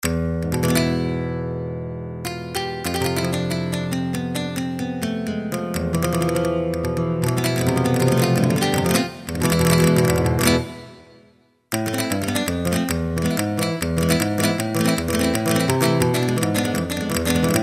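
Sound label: Nylon Guitar, Kurzweil K2000; GUITARS; N. Guitar w-Cast.; Kurzweil K2000 Value Pack; Extra Programs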